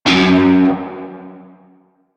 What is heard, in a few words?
Shawzin Oct One F Sharp; Music; Warframe; Narmer Shawzin